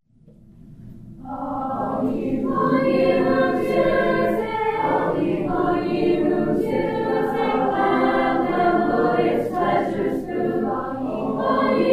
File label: Choral